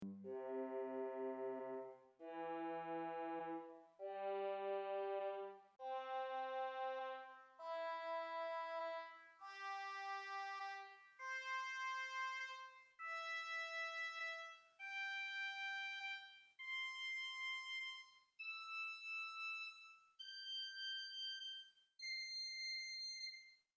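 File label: Swell Viola 8 + Celeste 8 arpeggio, organ stops; St Annes Moseley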